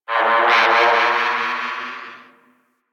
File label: Ship Mechanical Textures; Ambience; Warframe; Corpus Ship Remaster